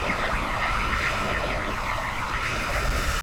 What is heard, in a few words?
Power Wave